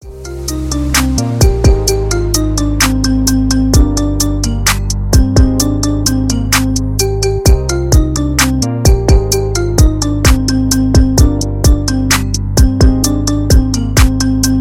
marimba remix

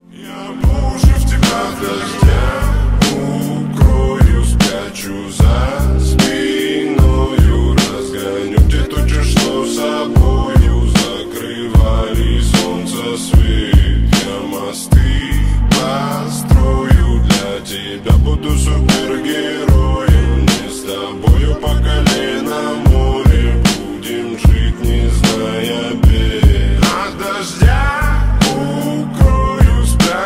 slowed reverb

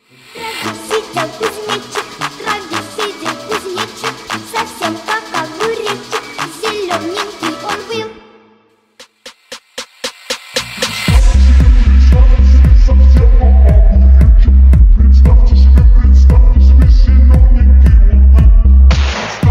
techno remix

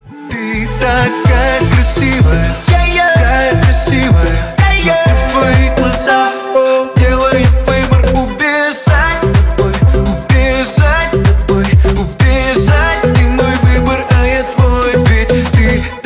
romantic edition

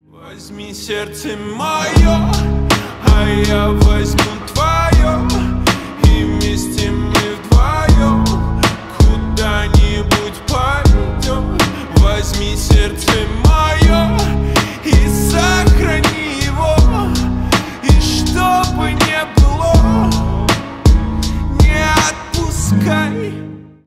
slowed reverb

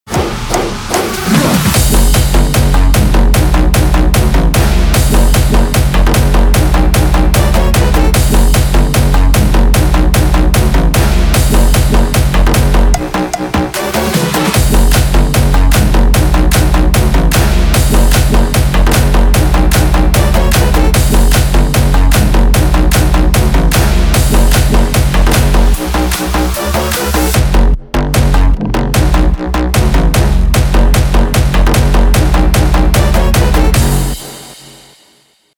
techno

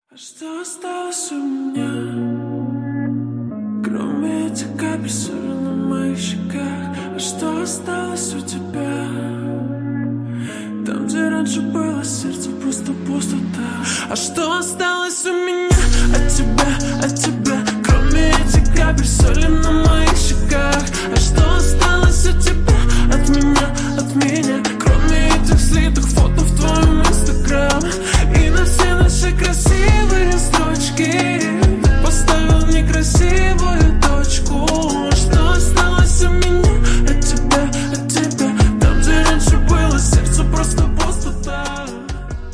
slowed reverb

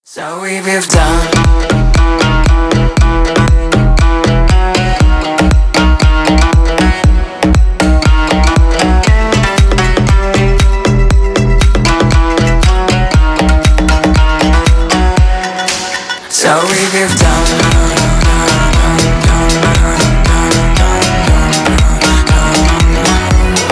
organ

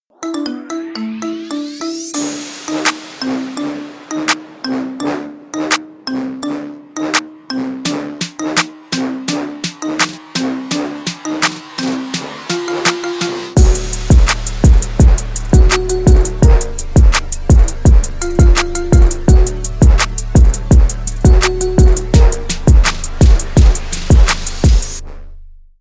marimba remix

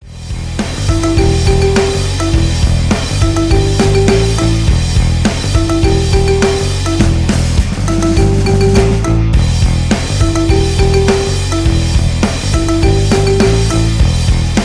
marimba remix

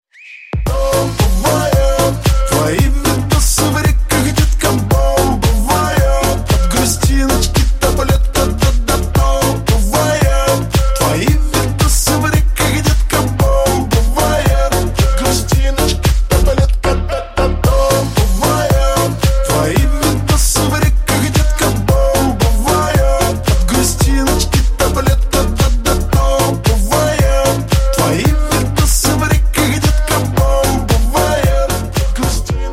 slowed reverb

